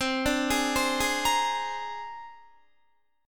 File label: C 7th Suspended 2nd Sharp 5th, C, down, Guitar; C7sus2#5; arpeggiated; 4E,4A,5D,5G,5C,6F